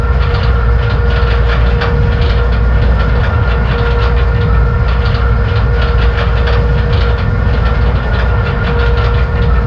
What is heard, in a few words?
Mixer Blades Loop; moul-assets